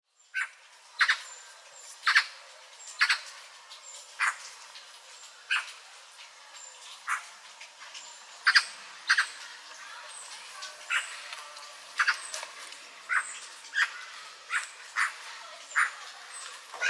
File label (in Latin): Pica pica; SETE